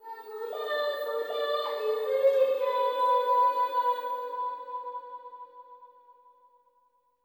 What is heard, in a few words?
LTLE GIRL, AFR.GIRL; Spectrasonics; Heart of Africa 2